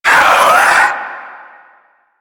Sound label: Swarm Vocal